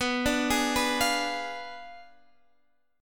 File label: B Diminished 7th B Guitar Half Step Down